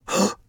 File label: Emote Male Shocked; Idle Champions of the Forgotten Realms